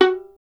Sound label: STR VLN, Solo Instruments; Miroslav Vitous